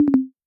Low Battery; China Telecom

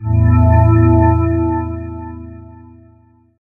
Relaxed Levitate, BlackBerry

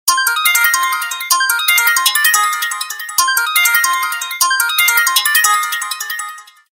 Animato Tone

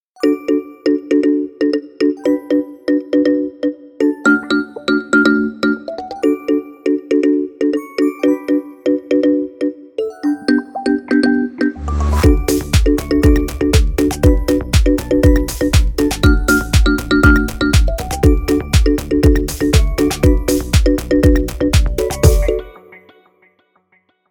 Kalimba; Infinix Hot 50 5G; ringtones

